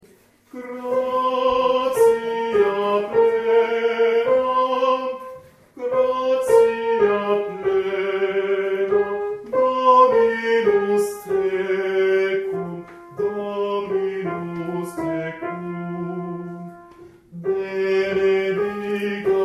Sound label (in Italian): Soprani; Ave Maria